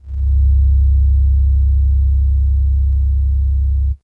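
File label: DX100 D#1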